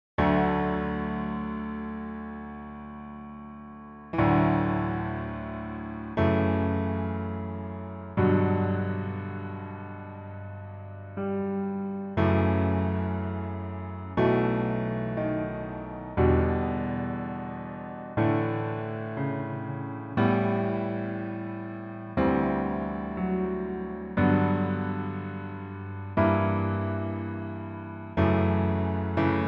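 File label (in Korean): Piano version -1키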